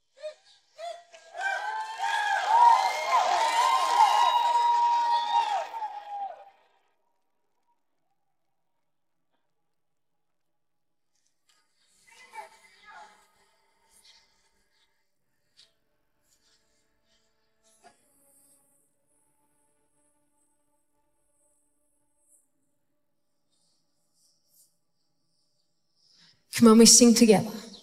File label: vocal, Karaoke